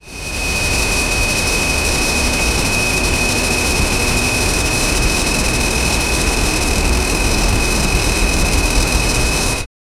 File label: compressor stall airplan; airplane